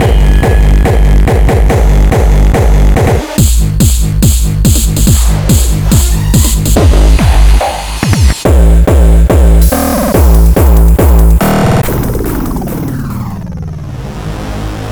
Hardstyle Loops and Hardcore Samples; Bluezone

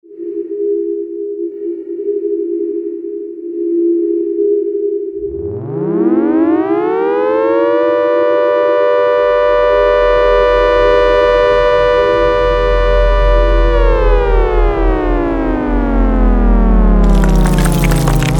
Alien Tripod Sound Effects; Bluezone

